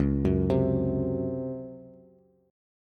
Db Major 7th Suspended 4th, Bass; x,4,4,5; arpeggiated; chord shape

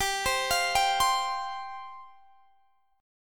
G 7th Suspended 4th G; G7sus4 arpeggiated

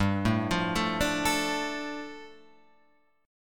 G 7th Suspended 2nd, Guitar Open F; arpeggiated; JGuitar

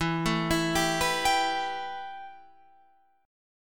B 7th Suspended 4th Sharp 5th E

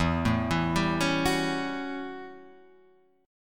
A 13th E, guitar chord

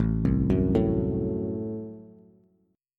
A Minor 6th A Bass; Am6 arpeggiated; JGuitar

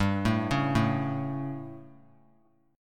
G Suspended 2nd Flat 5th G, chord; Gsus2b5 arpeggiated; JGuitar

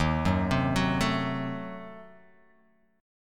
D sharp Diminished 7th; chordshape; x,6,4,5,6,4,x,x

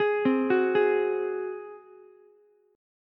F sharp Suspended 2nd Flat 5th, Ukulele; arpeggiated; JGuitar

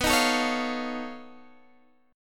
B 9th Flat 5th B down Guitar DADGAD

